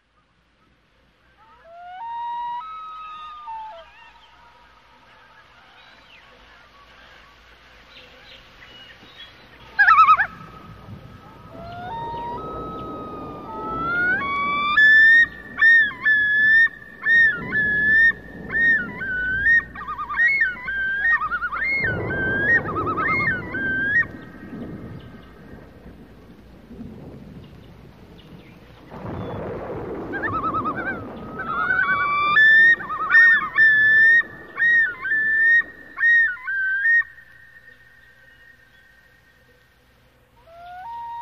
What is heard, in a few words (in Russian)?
Zvuki Prirody Mir lesnyh zhivotnyh